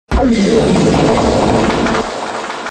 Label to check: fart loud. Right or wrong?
Right